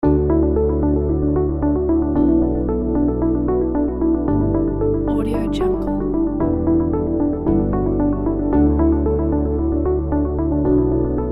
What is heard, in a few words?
minimal corporate